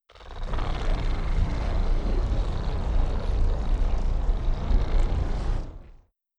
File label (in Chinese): 海怪音效; ambience stereo